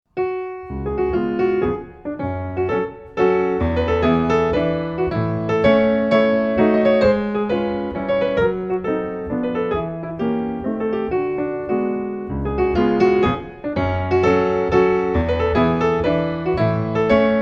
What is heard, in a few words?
mazurka; Chopin, Mazurka, op. 6, no. 1